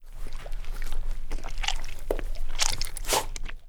animal dog eating; Call of Duty Modern Warfare

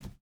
footstep hide wood jump start; Rust

